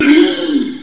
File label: gasp; Digital Paintball 2; player